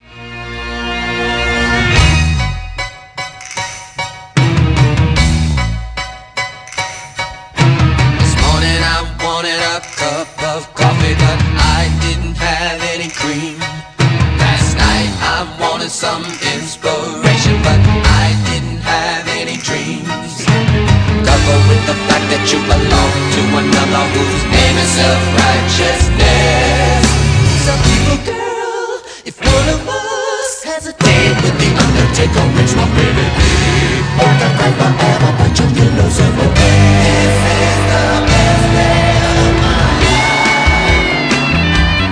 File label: vocal